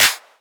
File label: clap single shot d sharp key; percussion; single hits one shots